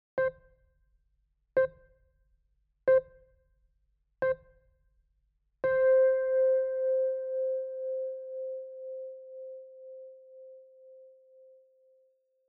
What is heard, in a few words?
beep